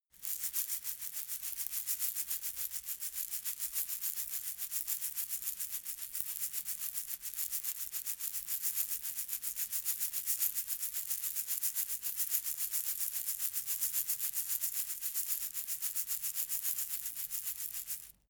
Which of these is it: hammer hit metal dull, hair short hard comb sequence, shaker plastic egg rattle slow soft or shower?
shaker plastic egg rattle slow soft